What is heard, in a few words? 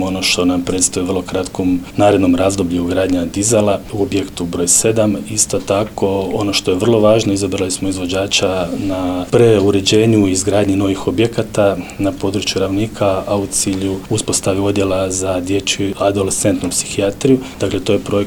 POP